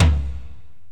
Korg x5, Drums

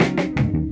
Diga Dum 083, Lounge Lo Fi Break Fillz

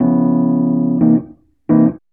ACID JAZZ

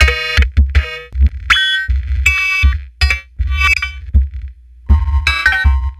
Melody, IDM

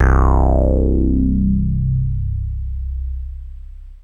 Bass Synths hits and octaves

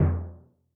Timp L, Yamaha DTXpress-II, Perc

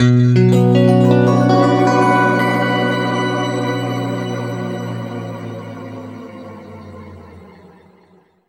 B, guitar chords; East West; Steve Stevens Guitar Samples Collection

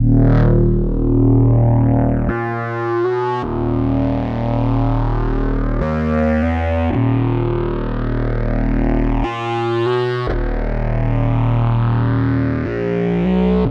Flawss Bass, Drum n Bass; KJ Sawka; Drum n Bass & Beyond

